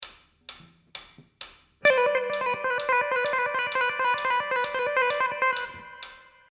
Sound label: gitar